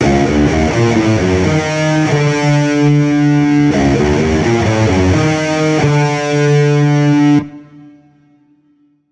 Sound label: Rock Guitar; Zystrix; ping-pong guitars panned left and right; The same reverb is used as in example #7 for the guitar bus but the room width is expanded to maximum